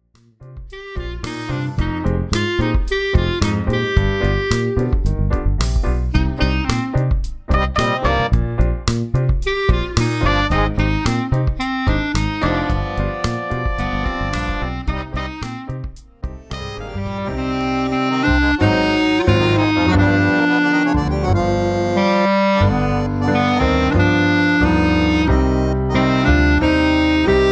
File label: Clarinet, Clarinet and Piano; Groovy Kick-Starts 2; six different styles